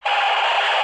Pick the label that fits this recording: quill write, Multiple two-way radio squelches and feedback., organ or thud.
Multiple two-way radio squelches and feedback.